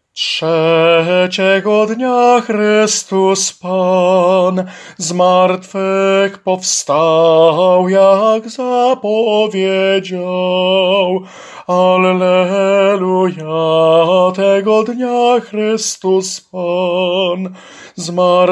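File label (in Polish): Piesn o zmartwychwstaniu SOPRAN, choir soprano part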